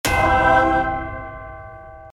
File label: Environment superholy